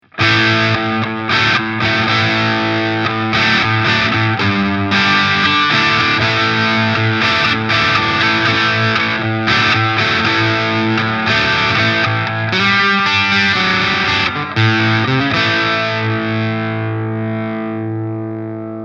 Steg Verzerrt Rock

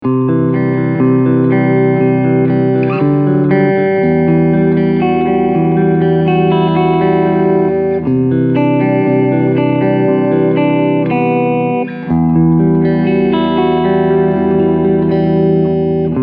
Cleansound beide PUs

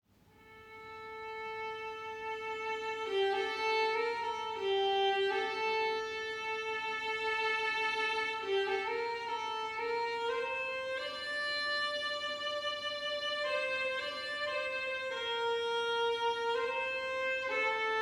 Musikaufnahme iPhone 11 Pro

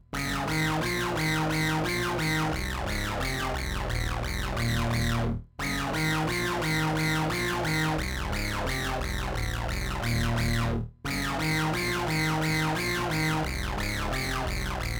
Synth Sequenz; Tascam US 2x2HR

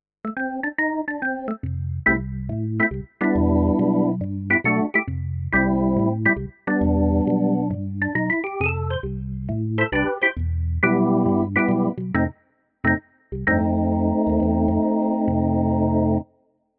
Roland FP90 Combo Jazz Orgel

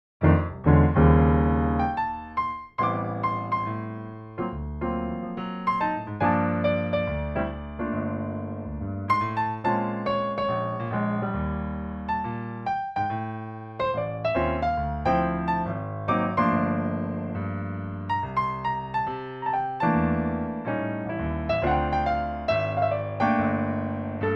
Yamaha Flügel

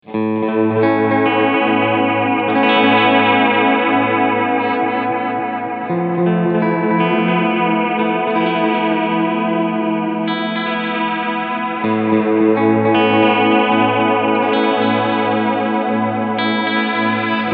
Pigtronix Echolution 3 Tap Modus Golden Ratio Mod 3 Uhr

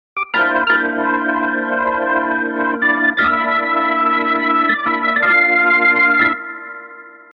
Orgel verzerrt

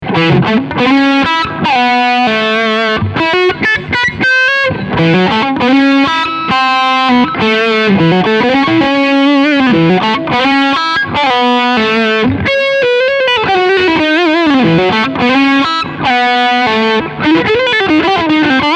Steg PU Overdrive Lick

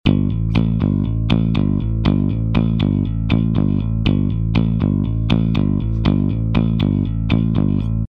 Bass mit Effekt LA Tube

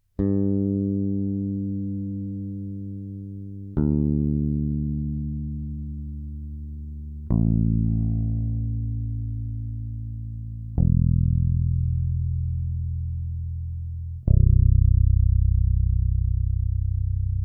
Phil Jones EQ E Bass Bass Regler

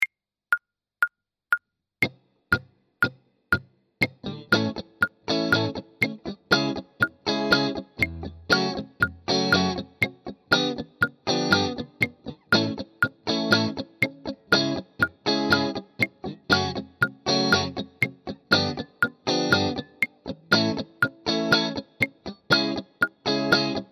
Beispiel Anwendung 120 BPM

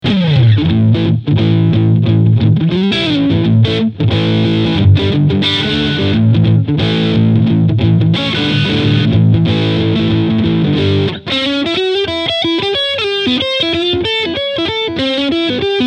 Leadsound Singlecoil Full Gain EQ 12 Uhr; Behringer HA 40R

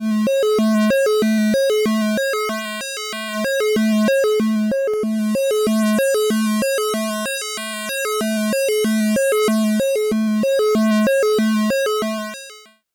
Erica Synths Pico System 3 Shape und Shape Reso

